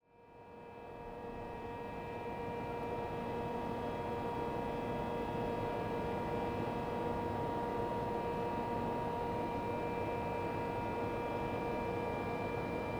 Industrial Town - Ambience - Close Distance, City Hum, Night, Electric Buzz; Industrial, Winter; ORTF; West Wolf; Distant Cities And Morning Parks; KM184